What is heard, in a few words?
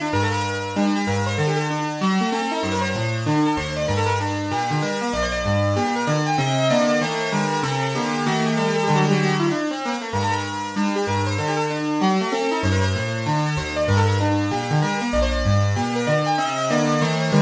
Oscillator KEY Electric Harpsichord; Alphatron; Syntheway